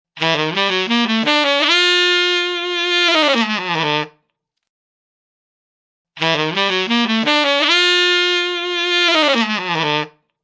Saxophone Riff; Ringtone

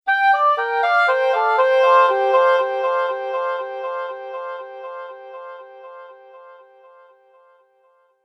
Subtle Musical Echo

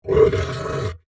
Monster Grunt, Horror, Monster, Grunt, Creature

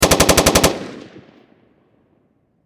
Machine Gun M16 Long Burst Close Perspective

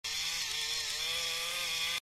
Toy, Small: Robot Move Effect; Miscellaneous Toy

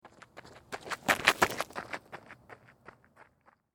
Footsteps Dirt Run Left To Right; Human

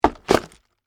Legos Set Down, Box, Plastic, Toys; Foley; Set Down Sound Effects

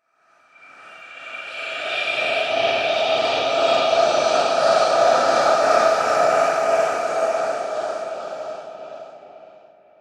Ethereal Spirit, Swirl; Blast Wave

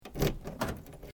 Car Subaru Impresa door key unlock; Hire Car in japan; Vehicles

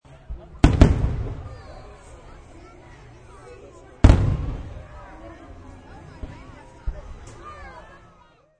Big Fr Wrks, Fireworks with crowd sounds.; Backgrounds; Mulitple Fireworks

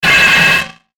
static bell; Static Bell Fast Glitch

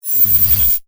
Electric Discharge, Magnetic, Science Fiction

